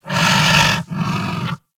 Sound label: Kubrow Feral Vocal Breath Walk; Warframe